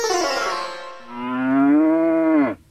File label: Warning Cow; masala drive switch gamerip 2023